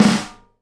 SNARE RUFF, Live Snares; PlatinumPhattCD1; E-MU Producer Series Vol. 8 – Platinum Phatt

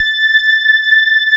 electric pianos; Wizoo Electric Pianos

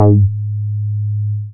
BAS MINI, MOOG; Sound & Vision - Gigapack II CD 1

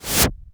cinematic impact wipe

